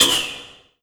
Oneshot; Sonic Foundry (Sony Creative Software); Esoterik Beatz; Quesy

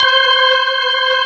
C5, Keyboards Old School; USB Soundscan vol.09; AKAI; BIG HAMM

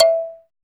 METAL E4 P, KALIMBAS; Spectrasonics; Heart of Africa

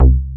ANAL BAS C2, Bass Analog & Synth; USB Soundscan vol.12; AKAI; VINTAGE

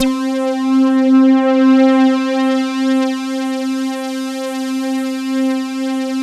MEG, synthesizer bass; Synthesizers; Soli-Music; World of Synthesizers VOL-1

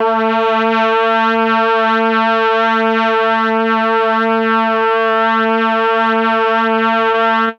MEL BRASS; ILIO; Propeller Island; Legendary M400

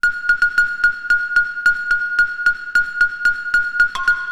Xylophone; Sonic Foundry; Stylus Pressure Urban Grooves on Digital Wax; Honk Diggity

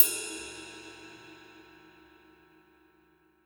RIDE, Drum Dry; East Collexion; Drum 1 Dry

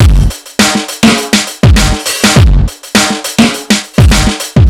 Distorted Loop, Drums & Percussion, Electronic; Sonic Foundry (Sony Creative Software) - Electronic Point Blank

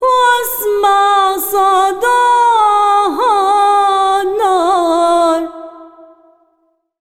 SINGER, ArabianTraditions

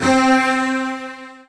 Virtuoso Strings, edgy, C3; ILIO; Kirk Hunter Virtuoso Strings VOL-2; 90 s Sample CDs

